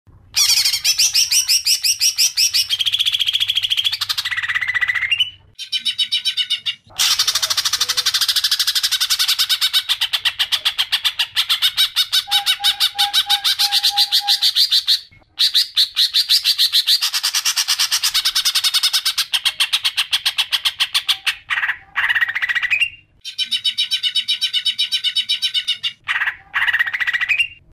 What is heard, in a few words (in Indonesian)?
cucak hijau isian tengkek